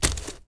blaster drop dirt; objects; Star Wars Obi-Wan; XBOX

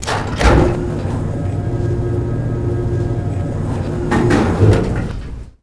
Elev Landing Ship, doors elevators; Star Wars Obi-Wan; XBOX

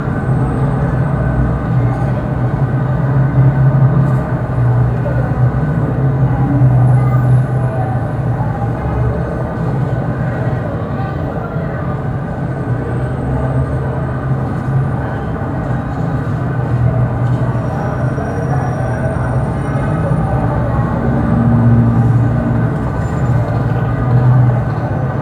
city heavy; ambient; Project Gotham Racing

